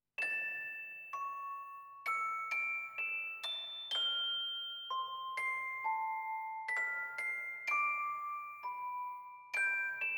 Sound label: orgel; Tales of the Abyss